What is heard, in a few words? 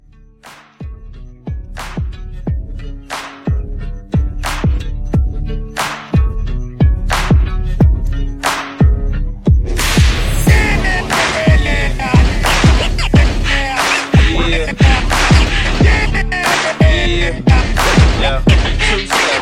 Scratch, 90